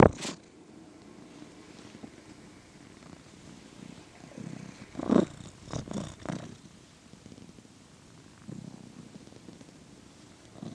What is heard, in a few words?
our cat purring